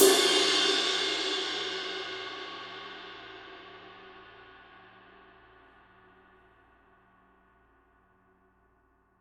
signature dark energy 22 dark energy ride mark i edge; paiste